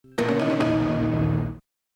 Power On basic; Samsung SGH-A516

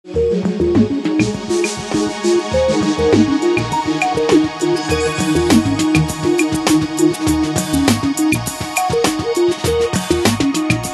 Lounge; Motorola KRZR K1m

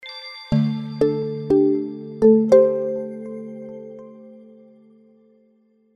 Power on, Samsung SGH-G810

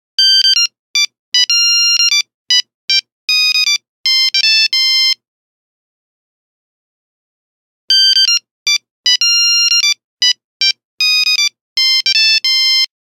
Nokia Buzzer; Windows Phone apps

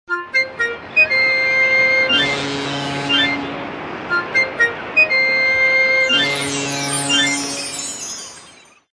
Sea Breeze, ringtones

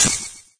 brick destroy; LG GW820 eXpo

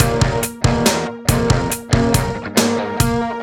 Electro Rock, ringtones; Google; NewWave Labs

